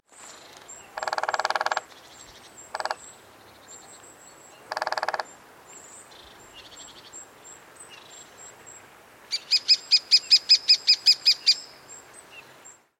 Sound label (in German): kleinspecht